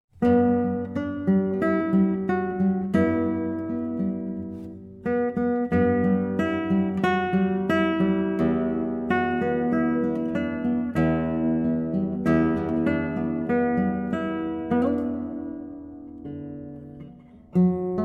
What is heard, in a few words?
guitar; Solo Guitar Compositions